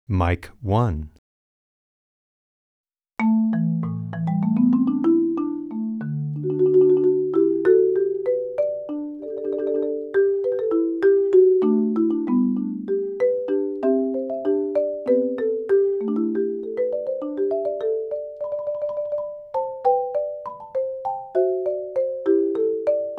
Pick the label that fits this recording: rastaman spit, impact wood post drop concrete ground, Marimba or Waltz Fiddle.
Marimba